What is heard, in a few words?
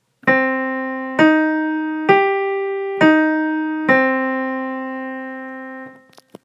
minor triad scale